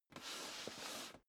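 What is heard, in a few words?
step boots metal dry slide long, footsteps; schritte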